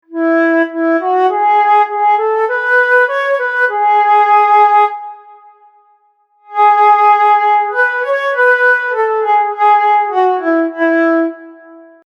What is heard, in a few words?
flute forwards backwards